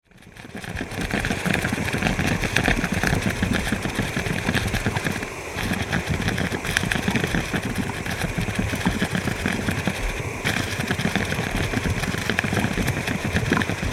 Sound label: falls mudpot; fairy